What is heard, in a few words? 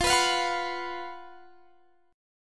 Gb Major 7th Suspended 4th F, guitar